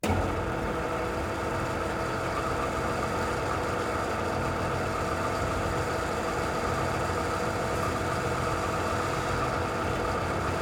Big Machines Coal-Dispatcher Start Speed Up Stop Side; MKH8040; Shapingwaves